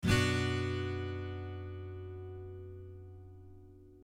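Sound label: Bm, guitar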